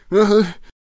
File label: Traveller Attack Vocals